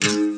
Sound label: Berimbau Single Trippy